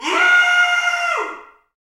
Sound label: Bullhorn, Afro Horns, Africa